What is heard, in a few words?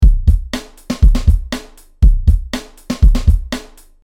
drum drive